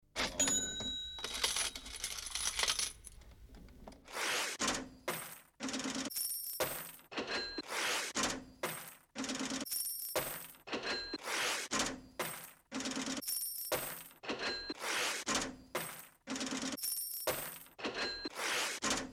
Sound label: Money Sound Effects